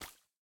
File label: Step Blood; MiSide